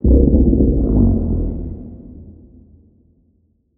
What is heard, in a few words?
Ice shark idle; Barotraumatic